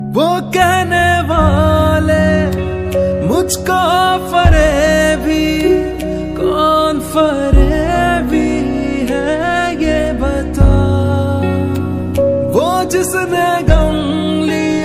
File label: Heart Broken Hindi Song Ringtone